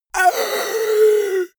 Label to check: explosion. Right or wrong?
Wrong